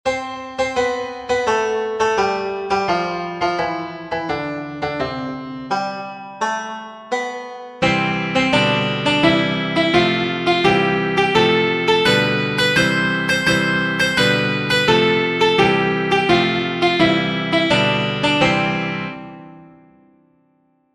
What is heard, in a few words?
BackingTrack